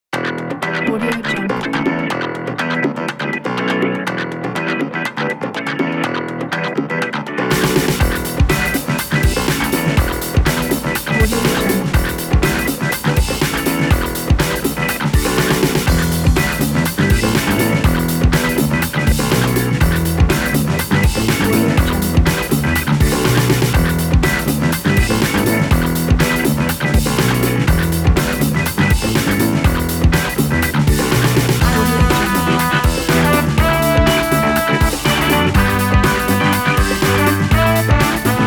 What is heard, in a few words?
action funk